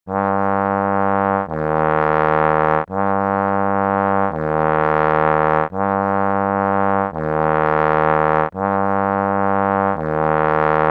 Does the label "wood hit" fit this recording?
No